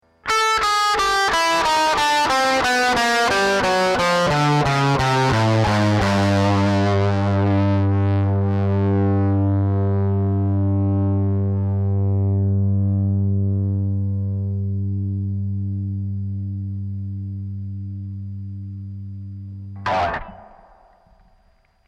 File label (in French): disto gamme; gamme son disto depuis La 440Hz